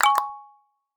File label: click